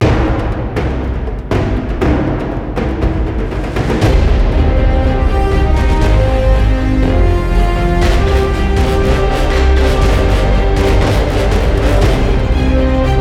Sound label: Win; Need for Speed The Run